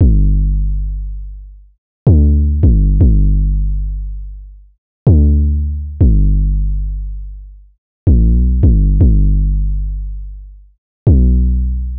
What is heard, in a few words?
808 loop 160 G; Slate Digital BASSHOLE Sample Pack